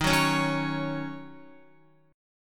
D sharp Minor 7th, Guitar; strummed; JGuitar

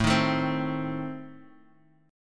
A Diminished Bass Standard; 17,18,19,17; strummed; JGuitar

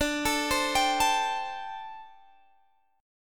D 7th Suspended 4th D Guitar Standard; arpeggiated; capo 9

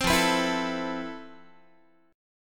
F Major Sharp 11th, Guitar; strummed

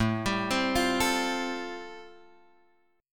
A Minor 7th Sharp 5th A, Guitar, Drop D

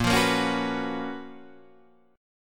Gb Major 11th

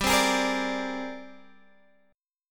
G sharp Major Sharp 11th G, chord

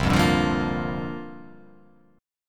C 9th Suspended 4th C down

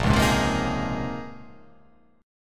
Bb Minor 11th, Bbm11 chord; strummed; JGuitar

